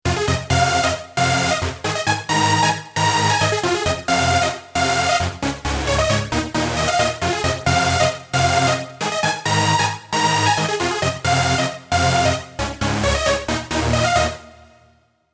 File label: trancy